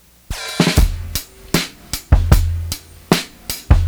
Willie playing drums we were like 15 when we recorded this